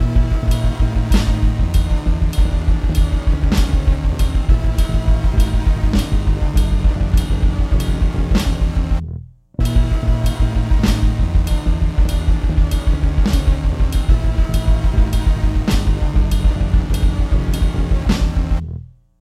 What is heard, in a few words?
weep woop